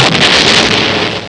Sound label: explode; sole defender